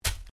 Organic stab; Various Organic Foley sounds; Stab And Slice; Combat

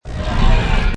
Hi Tech Panel Servo Button

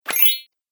Pantomime Fairy Attack, Cartoon; Cartoon Sound Design Sound Effects

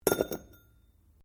METAL ON STONE IMPACT GENTLE; Metal (Sound Effects:Materials)

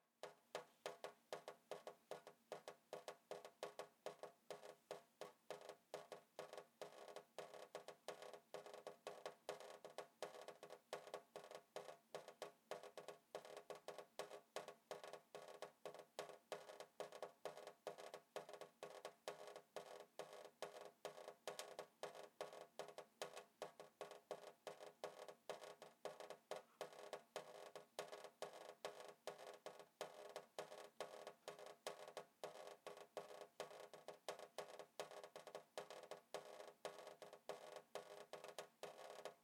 Water Sink Leaky Faucet Drip Slow, bathroom

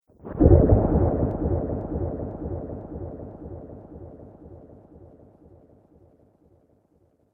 Underwater Element, Water; nature

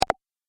Game Item Dropped, Video Game Sound Effects

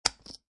Poker Chips Multiple Dropping On Felt; Poker chip drop on felt: Multiple poker chips being dropped on felted table; Lynne Music

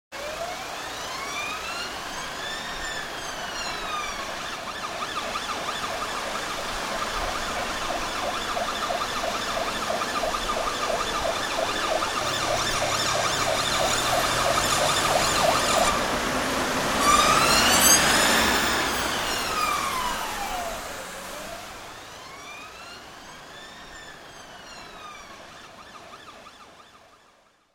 Emergency Siren in city centre traffic; Emergency Vehicles

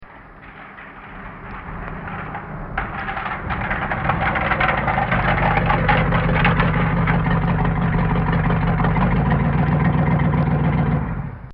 Pull-Up And Shut-Off

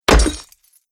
Wooden Club Smashes Chainmail Armor; Battle Sound Effects

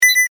Multimedia Chime, Technology, Multimedia, Chime

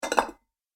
Dessert Bowls Stacked Together Noise; Dishware Sound Effects; Household Sound Effect

